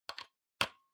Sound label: PC Hardware Power Jack Plug In